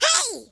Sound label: oof, American Wrestler; speech